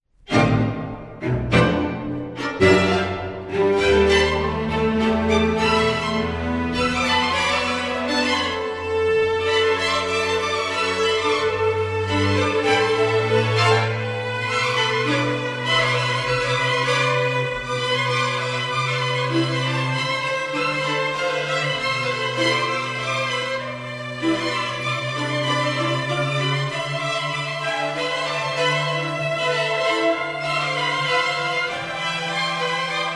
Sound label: String Chorus; Lukocius